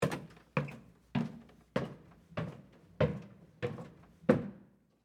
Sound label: Stairs Up Wood, Footsteps, Stairs, Up, Wood, Tall, Steps, Underneath, Perspective